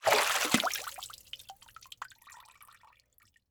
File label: Liquid Water Water Hand Rise From Water Drip